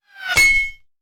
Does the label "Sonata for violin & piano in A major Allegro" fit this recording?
No